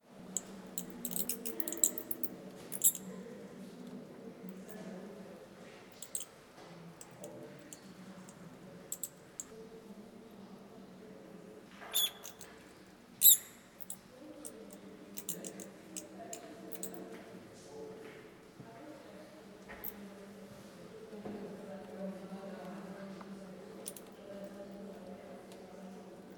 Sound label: Bat, Soundscape